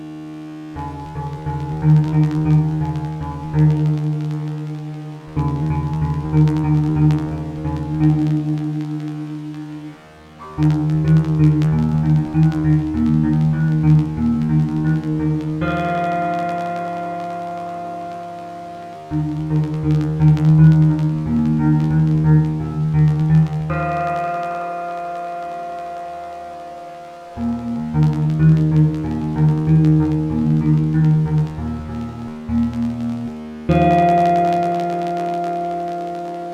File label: guitar short delay